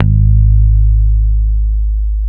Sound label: PICK G#2, Bass; Spectrasonics - Bass Legends CD 2